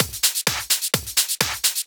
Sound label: Clubhouse Loop 128 BPM